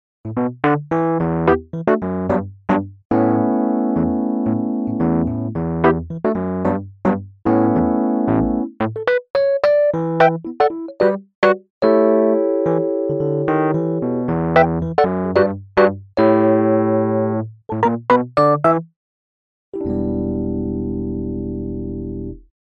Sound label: Vox Continental E Piano